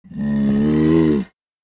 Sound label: Sea lion grunt; fish and aquatic